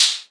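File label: hi-hat, Maestro Rhythm King 1966 drum machine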